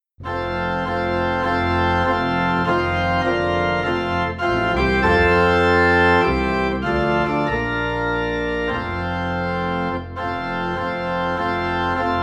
Organ Low in F; Hallelujah What a Savior

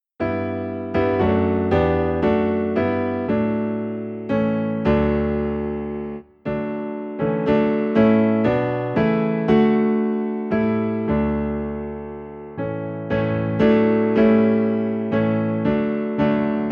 Piano, Low in D; Grace Greater Than Our Sin

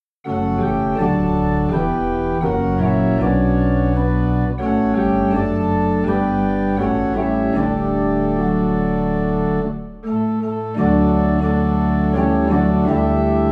Organ Low in D; Infant Holy Infant Lowly; christmas

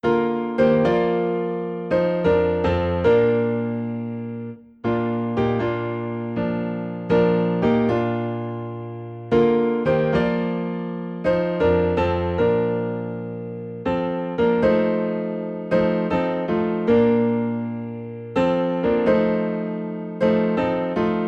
Piano in B Flat; Rock of Ages

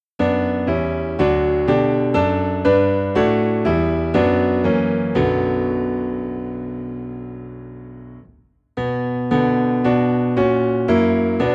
Piano Low in B Minor; christmas; God Rest Ye Merry Gentlemen

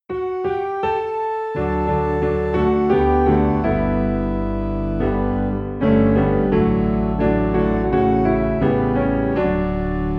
Piano and Organ Low in D; My Savior First of All

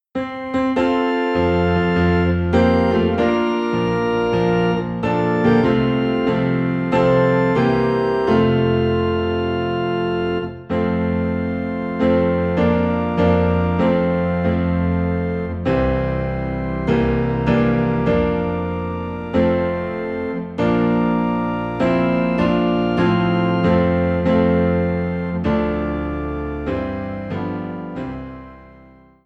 piano and organ low in F; Christ Arose

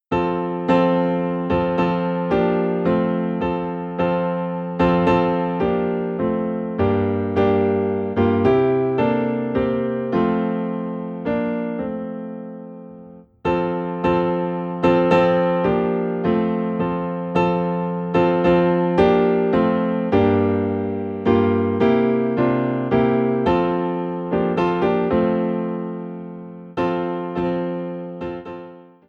Piano in F

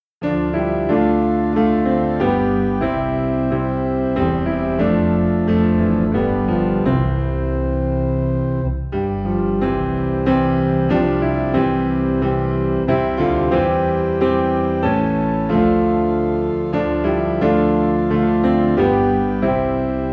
Piano and Organ Low in C; Away in a Manger (Alternate); christmas

